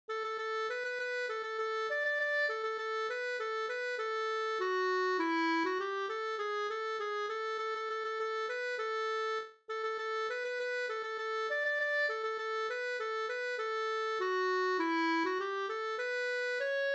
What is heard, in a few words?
polka, polkett